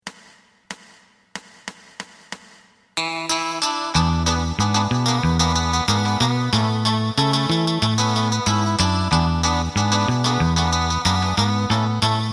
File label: drum